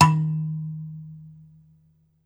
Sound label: BASS KALIM, Kalimbas